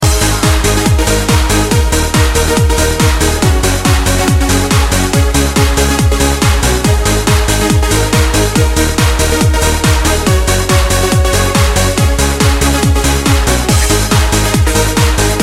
Loop Melody

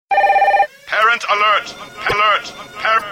Parent Alert

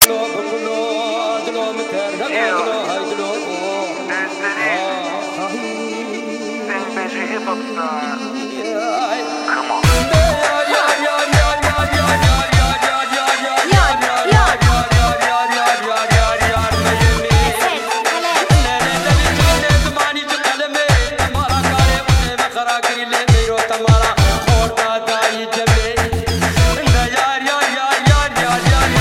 Kurdish Hip Hop

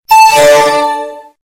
Notify Windows

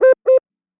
Double Notification

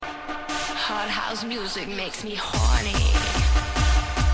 Hard House Sms

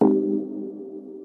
SAD sound

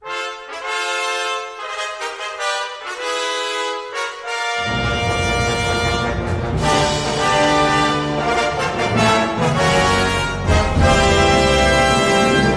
Trumpets; LARP

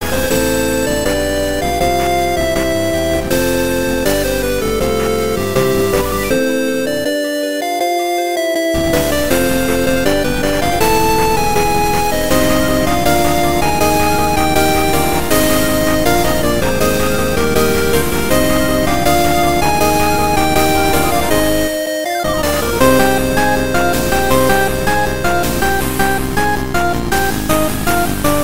8bit

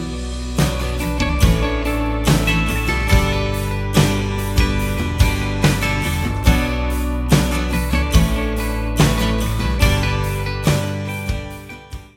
Acoustic

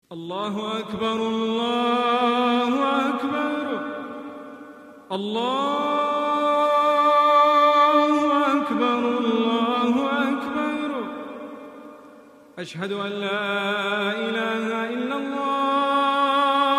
song

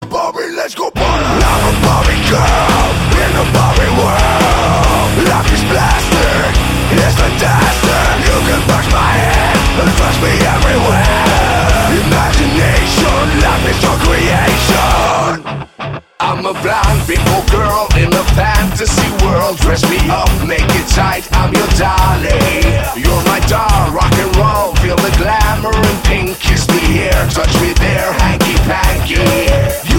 Metal

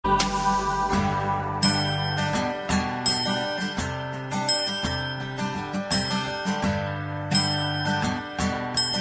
Dancing Bells

